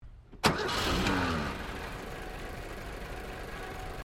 CAR HOLDEN HD PREMIER IGNITION BONNET OPEN IDLE, Classic Australian cars; 1965; Vehicles